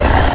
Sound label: Snarl